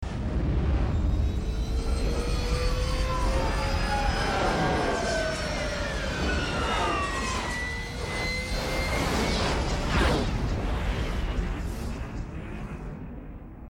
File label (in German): duesenjaeger